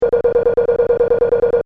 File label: Beeps Data Transmission Technology; Beep Sound Effects; Scifi Science Fiction Multimedia; BBFX